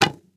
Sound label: Bow Release; Game Weapons; Military; Game Essentials